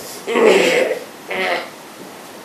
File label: constipated grunt